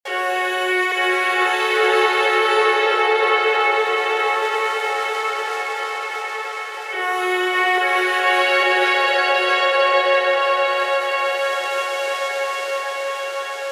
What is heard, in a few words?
140 Loop Halo F min